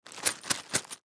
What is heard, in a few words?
Coins Money Bag, Coins Bag Shake Multiple Short; Objects; Blastwave; Foley